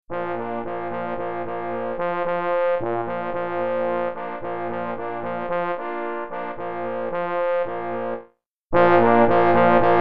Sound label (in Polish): na 3 parforsy; Cz. Trybus; W lowisku